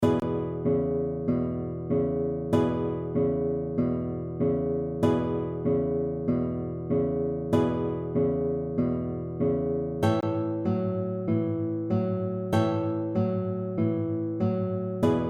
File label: basic blues pattern